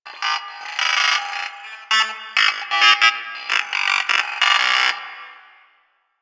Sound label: Mining Laser Ring Loop; Weapons; Warframe; Eidolon Mining Lasers